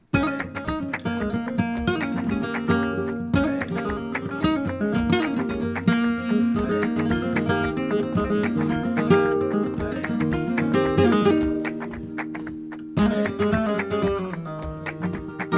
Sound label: falseta bulerias